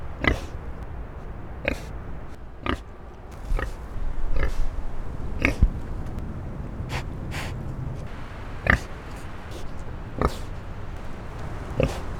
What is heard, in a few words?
Sus scrofa; Wild boar; The food soliciting from zoo visitors with quiet grunts